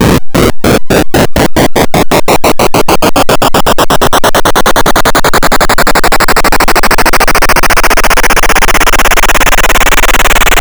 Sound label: noiseacopter